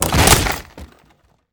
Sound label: Door Break Wood